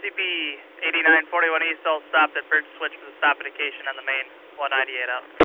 Bridge Switch

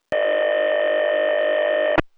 CSX Tone

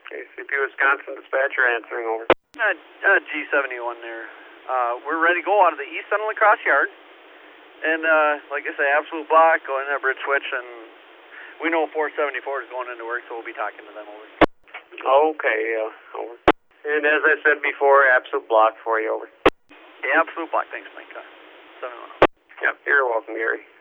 Departing La Crosse

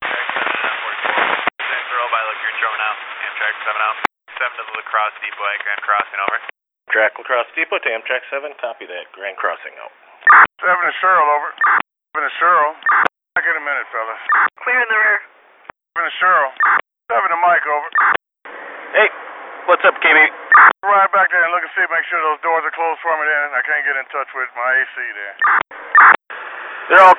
Amtrak

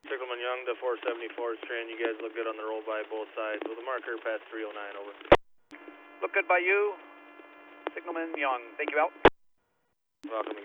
Roll By

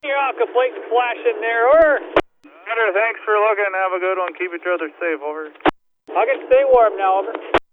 Roll By, CP

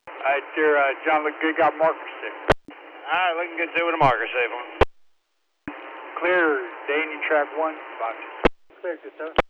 Roll By, CSX

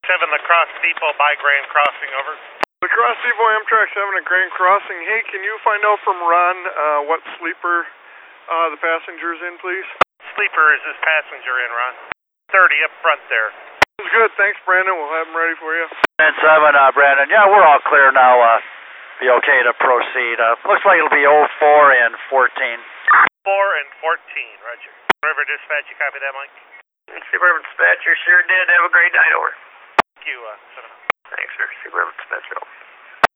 Amtrak

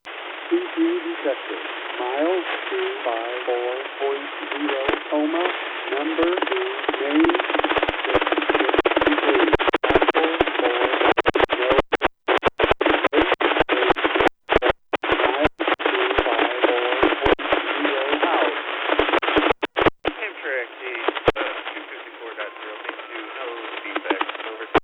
Amtrak

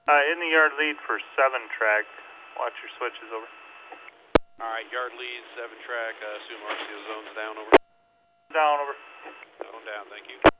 La Crosse Yard

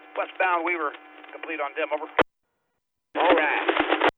Roll By

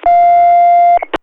UP Tone

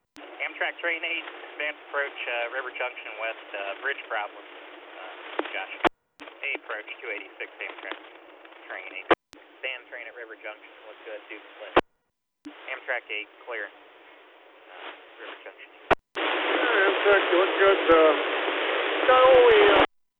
Amtrak

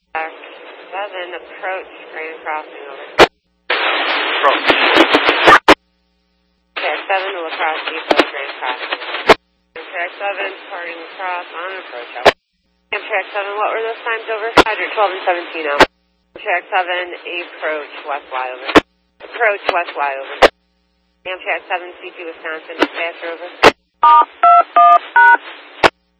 Amtrak

